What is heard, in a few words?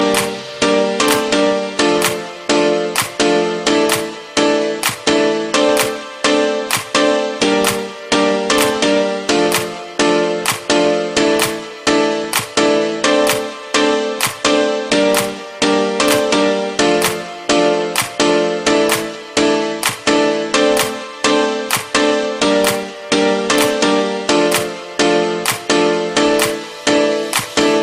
Piano Claps